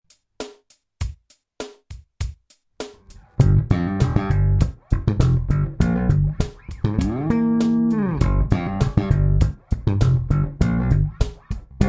Medium slow slap in G

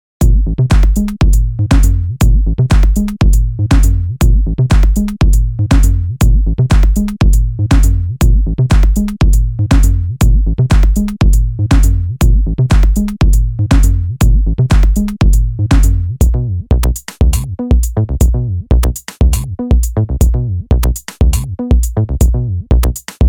techno bass loops

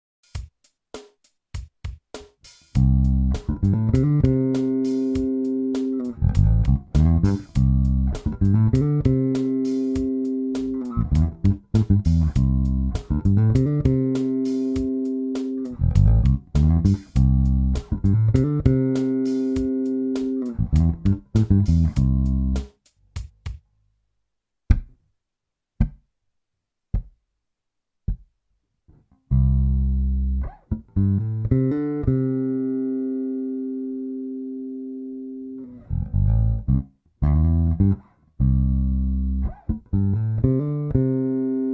Major pentatonic fills bassline in C

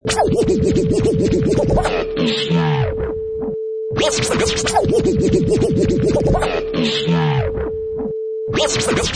Vinyl Crazy Scratch 105 bpm

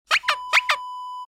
squeeze toy

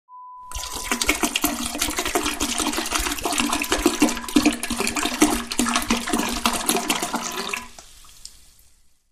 Pouring Liquid

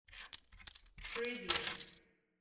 counting coins

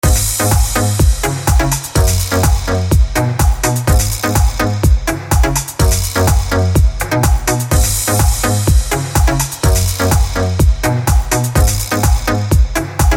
tropical

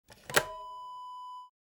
camcorder door close

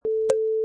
Blip

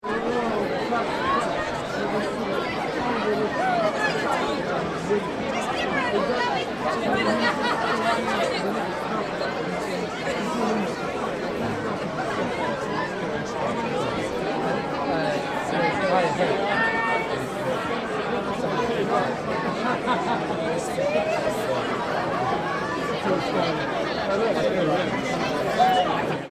Wedding Party Ambience

